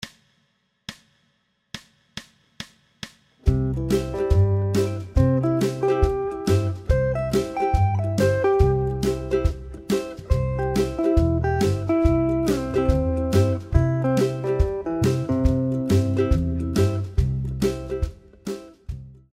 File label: uke pop c only